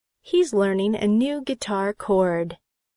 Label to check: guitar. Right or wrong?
Right